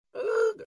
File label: awooga; disney; mashup